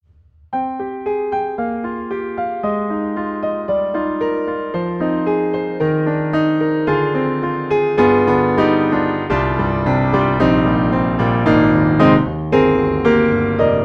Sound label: Pianoteq D4 Jazz BA, piano; MODARTT S.A.S.